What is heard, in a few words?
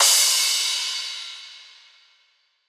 Riddim Crash; One Shots; Hats & Cymbals; Ghosthack; Riddim Essentials 2020